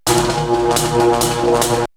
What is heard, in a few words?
Electric; X-Static Goldmine